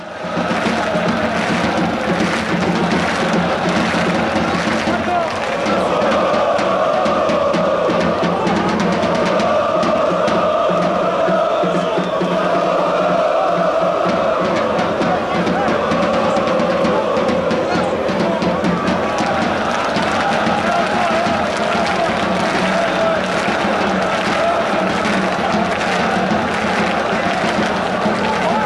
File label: do do do drums, fanchants